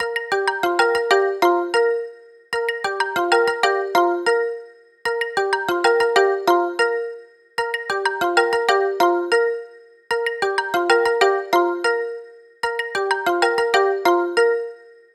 Cheerful, ringtones; China Telecom

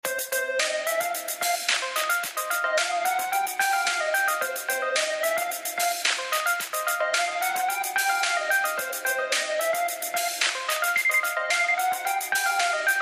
Default Tone; LG; VX5600 Accolade; VZW

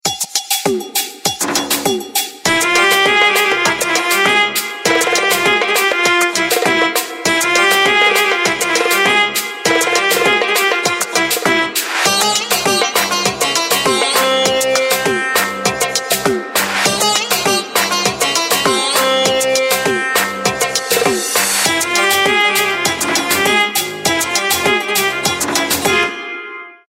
Arabian night, phonetones; Samsung GT-S5233 Star

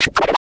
drumset, drums

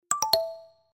Low Battery

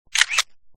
camera click; Gionee; Pioneer-P1

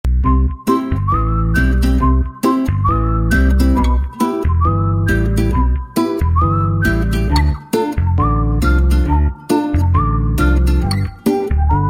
a little kiss piano, ringtones; Meitu; Kiss C520

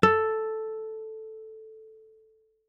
dial tone guitar

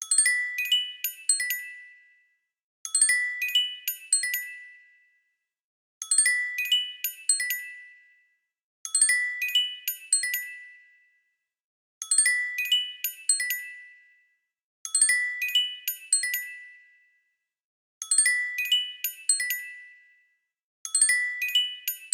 Simple Sapphire, ringtones; LG Wing 5G Verizon

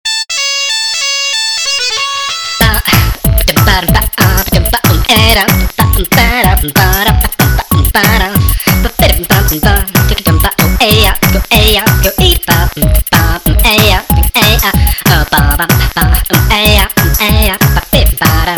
Funny Dark fanfare; Arphiola ringtones

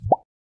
Email Sent

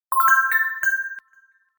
kiss sms, notifications; Meitu; Kiss C520

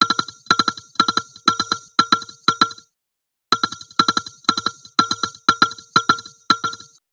coded, ringtones; Nothing Phone (2)

